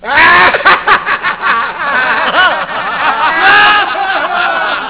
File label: hahaha